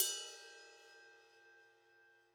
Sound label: Ride, cymbal; SAA Rock 20; apulSoft apTrigga2; Multi-Velocity Cymbal Samples